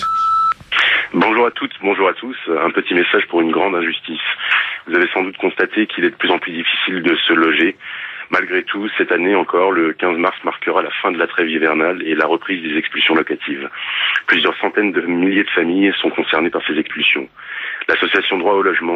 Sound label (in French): Manif contre expulsions locatives, repondeur